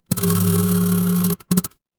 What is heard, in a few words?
office phone vibrate